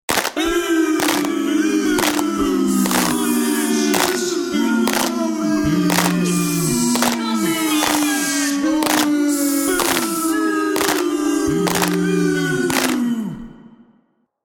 Group of disapproving people booing and jeering with slow claps; Crowd Boos